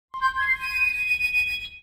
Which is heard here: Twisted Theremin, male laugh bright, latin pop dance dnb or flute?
flute